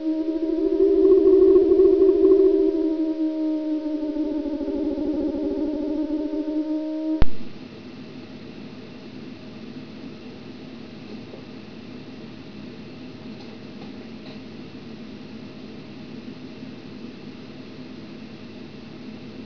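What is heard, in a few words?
alien ambience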